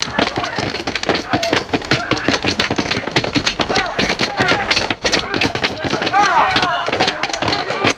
crowd scuffling with clattering shields and footsteps; foley